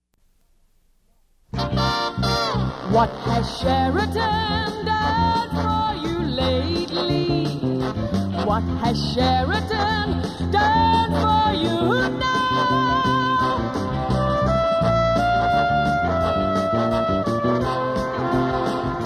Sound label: Jingle; Demo Reel incl Pepsi medley; commercials of the 60s